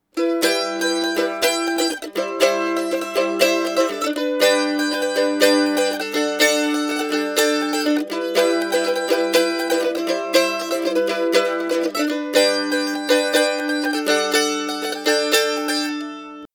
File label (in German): Mandoline AKG C214